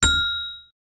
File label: Bell puzzle